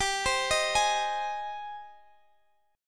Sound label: Ab Major, G, guitar chord; Ab/G arpeggiated